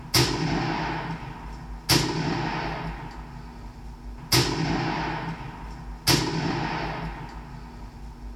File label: gunshots